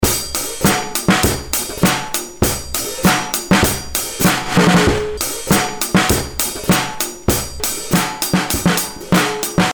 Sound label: drum loop